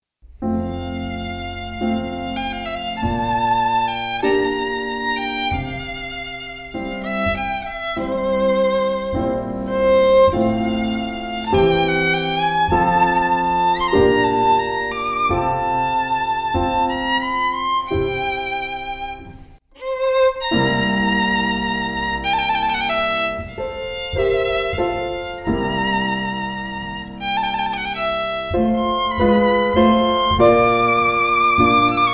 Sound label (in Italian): Duo Romanza in fa